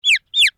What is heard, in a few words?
pet chick; mir2; crystal